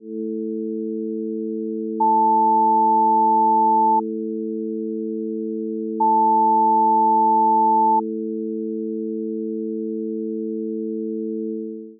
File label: without ducking; music ducking